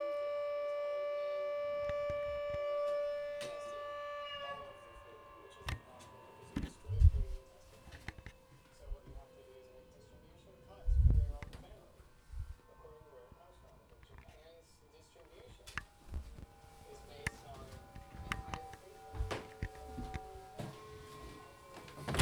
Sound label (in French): sirene orage, Fukushima